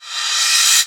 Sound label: Reverse Cym, Cymbals